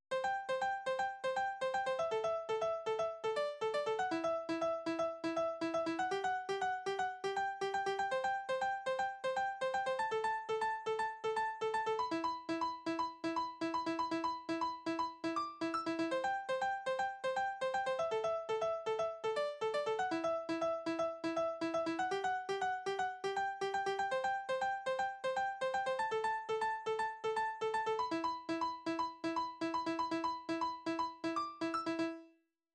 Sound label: Trance ringtone; Nokia